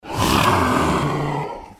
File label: growl; shaoxiadiablo